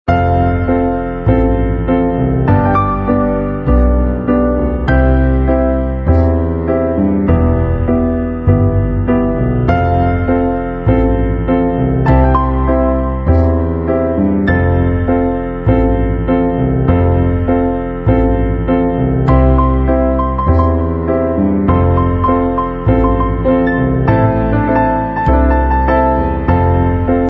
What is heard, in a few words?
royalty free music